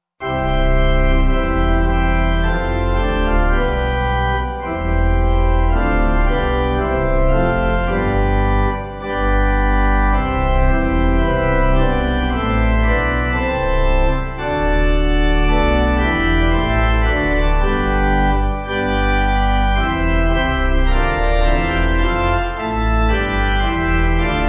Now In The Days, Corona, Pipe

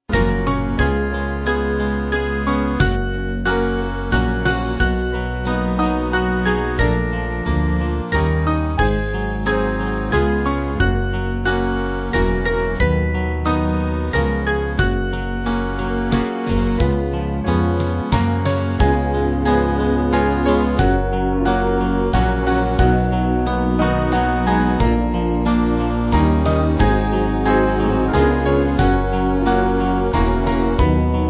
Band; Jesus Calls Us; Galilee

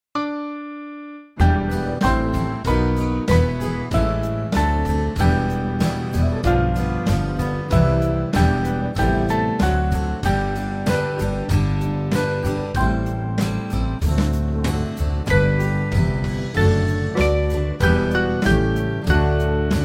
Lord Keep Us Safe, Combe Martin, Band; Small Band

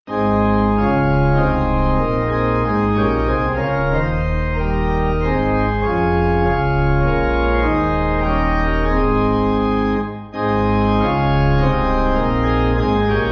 Pipe; Full Salvation

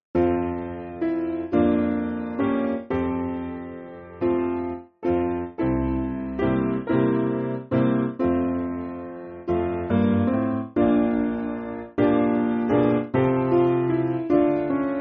Search Me O God Maori SPiano

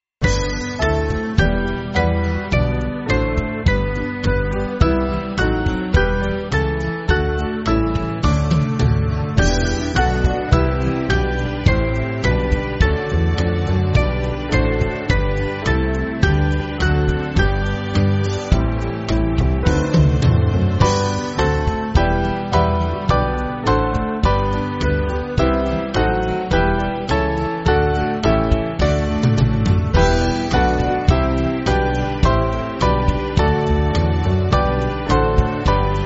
Captain Of The Saintly, Vienna, S Band

